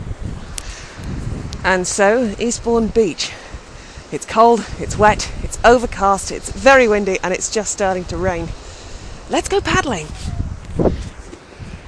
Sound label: paddle